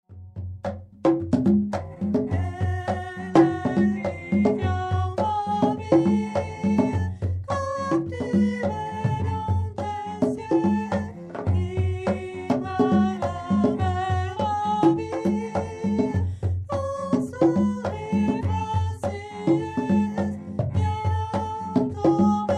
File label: Funk